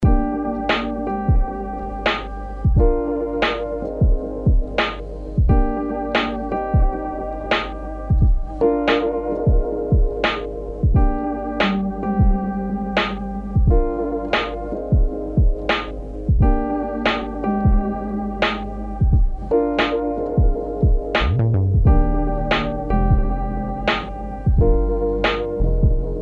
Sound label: Beat